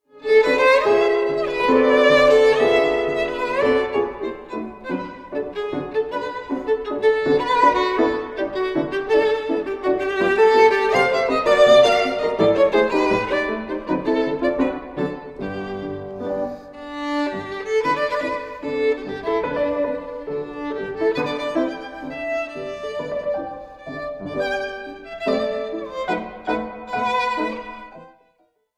syncopation for violin and piano; Fritz Kreisler

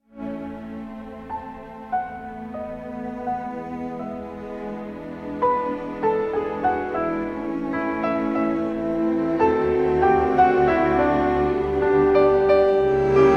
piano concerto no 1 in g minor; felix mendelssohn; london symphony orchestra; op 25

